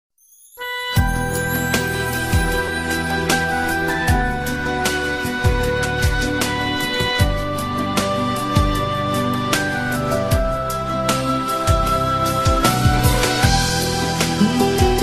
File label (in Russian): armyanskaya melodiya